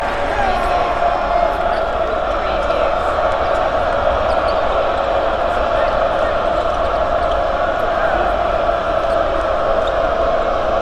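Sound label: Crowd Jeering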